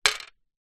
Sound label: leisure game plastic pigs x2 throw onto plastic board; pass the pigs; zapsplat